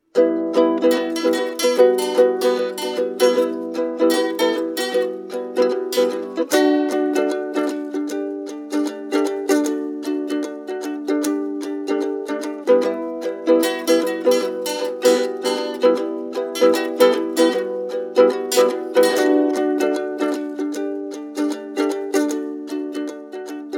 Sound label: Ukulele Test, Cardboard Ukulele; Another Cardboard Ukulele